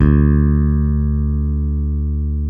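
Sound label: P BASS open D, pick bass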